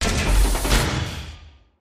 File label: belt up down; eggomatic